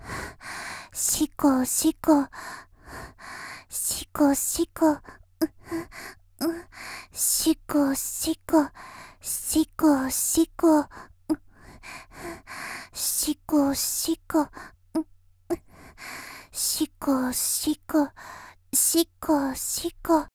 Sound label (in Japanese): 「手コキ声」（シコシコ）と言いながら静かな喘ぎ; 綾波系; 少女